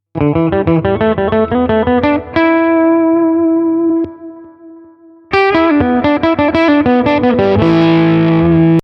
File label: clean to dirty; custom DeLuxe